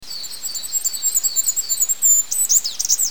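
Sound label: goldcrest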